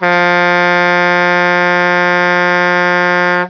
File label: horn